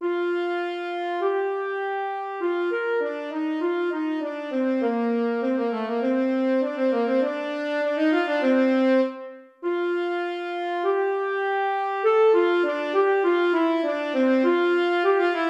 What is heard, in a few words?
Alto Sax; Band Audition